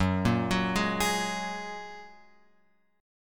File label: F sharp Major Add 9th; F#add9 Chord {2 1 4 1 X 4} arpeggiated